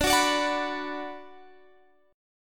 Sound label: B Minor D